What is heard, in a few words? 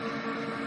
spin Loop Sound; jackpot 6000